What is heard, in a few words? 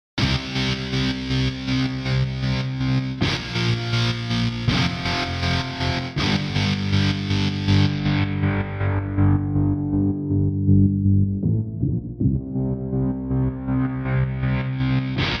Instrumentals